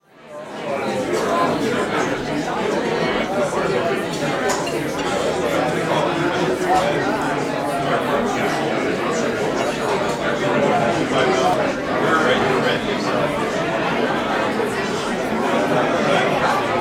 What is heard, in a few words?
Crowd Indoor Active Social Crowd; Walla; Sound Ideas; Walla Series